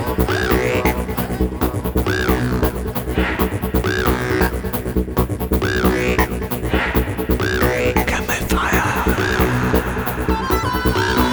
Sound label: vocal effect